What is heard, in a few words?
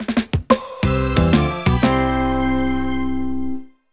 Sting; active health with carol vorderman; nds